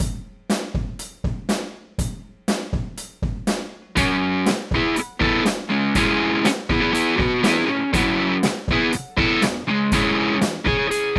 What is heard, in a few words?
shure sm57 drums